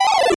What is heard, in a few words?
8bit se